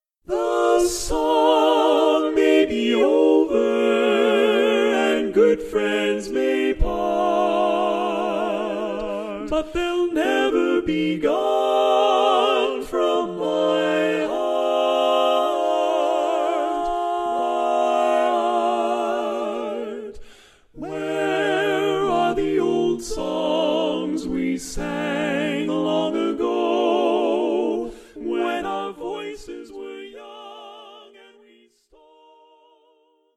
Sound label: Old Songs Female